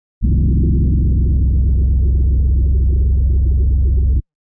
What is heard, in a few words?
Shrt Deep Evil, Deep creepy short sound excellent for evoking fright and fear.; Deep Tones; Sound Design